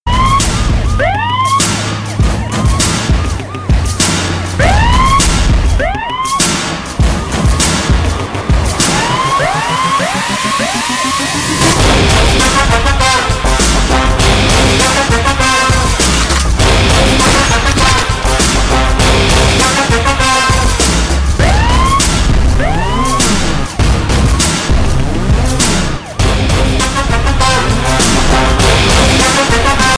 mass bgm police bgm; poojai; sathiyaraj

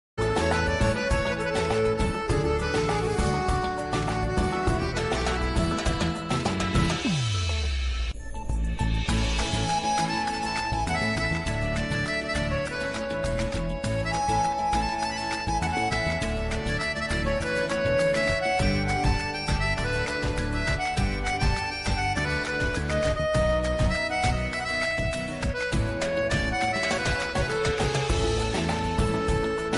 instrumental ringtone; kundali bhagya

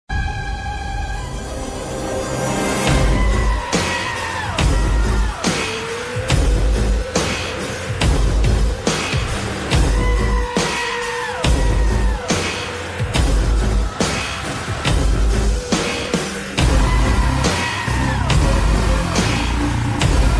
mass tamil movie bgm

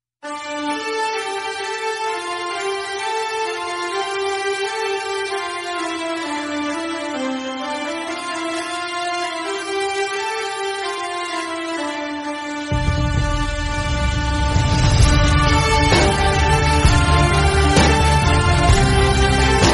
instrumental ringtone bgm sad ringtone; bodyguard; salman khan

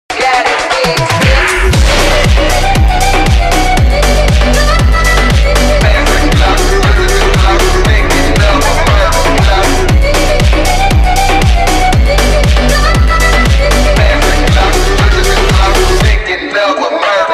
slowed reverb

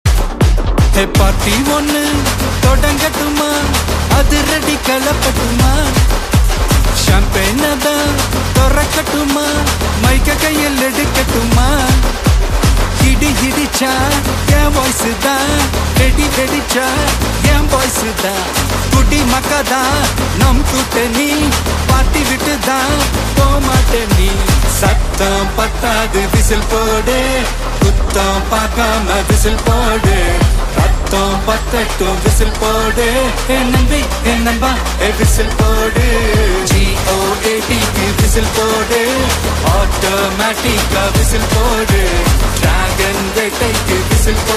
Tamil ringtone; the greatest of all time

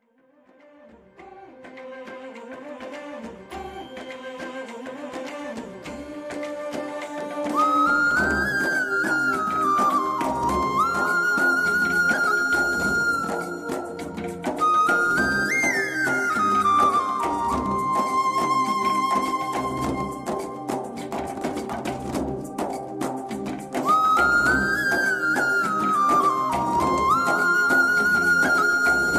flute ringtone song

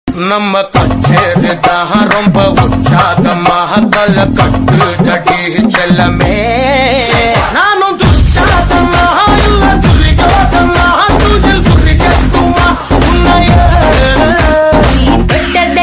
song, tamil; kpop tamil

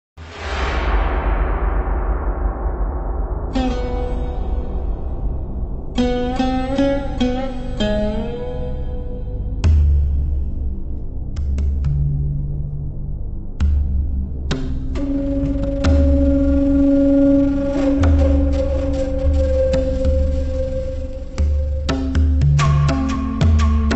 background music; ikimizin yerine; balika vadhu

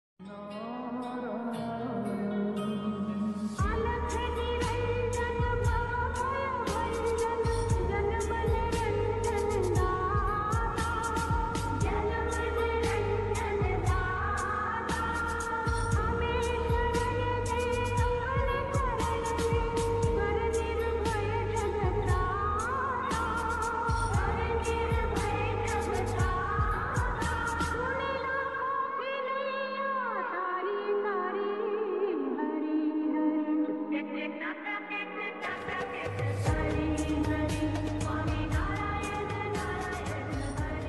rap lofi music lofi ringtone

